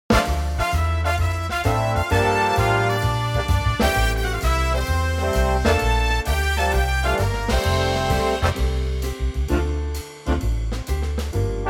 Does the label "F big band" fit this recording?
Yes